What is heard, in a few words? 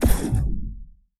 shoot pulse gun